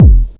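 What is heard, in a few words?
125 drum 2 R, Fresh Disco House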